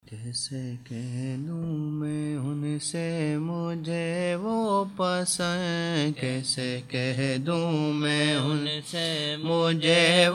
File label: naat; chorde saari dunya bas un saw ke liye